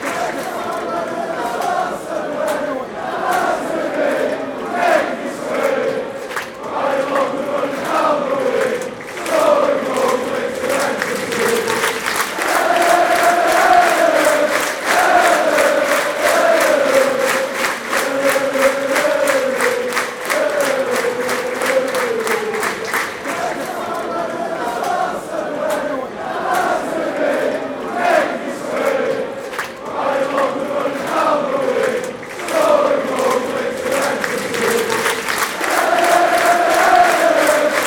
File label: fan chants; united kingdom